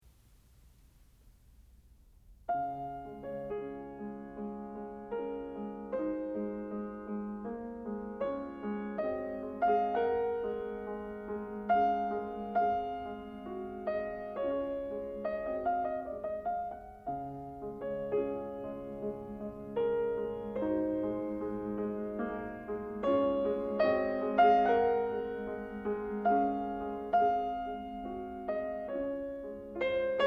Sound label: piano, prelude in d-flat; frederic chopin; raindrop prelude